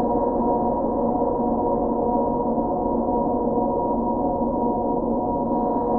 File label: InfiniVerb Voice, sparse soundscape Loops